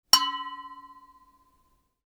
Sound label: UI Quirky